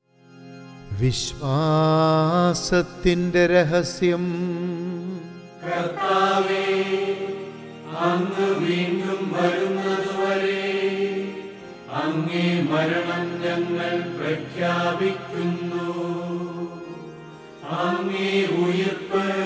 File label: Chant; ordo cantus